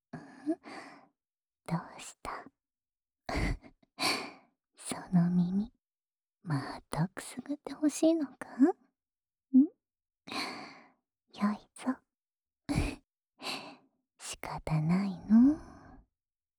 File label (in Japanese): 安眠用お耳ふーふー（右耳）, ASMR